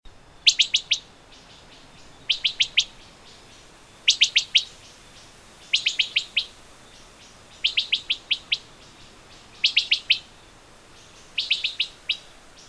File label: wood thrush call-notes; andrew spencer